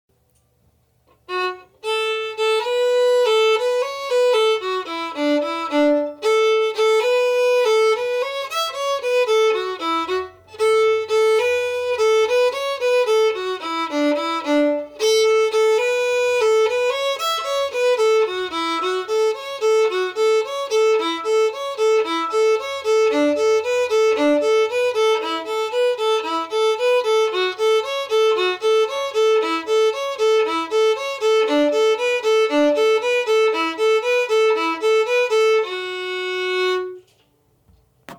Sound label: Epic Reel fast